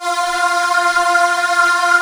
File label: AIR VOX F3; AKAI S-Series CD-ROM Sound Library VOL-7